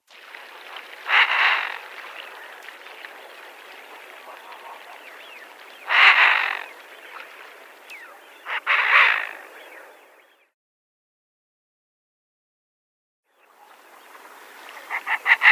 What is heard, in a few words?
Eurasian Wigeon female